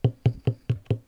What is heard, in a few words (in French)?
escalier en bois